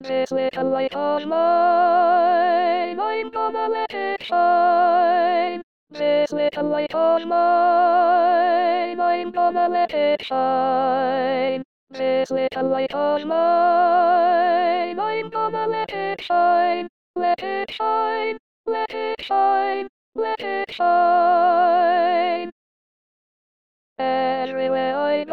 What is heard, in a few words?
Sopranes